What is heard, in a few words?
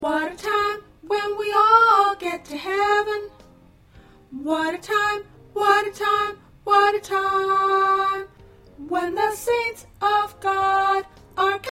Alto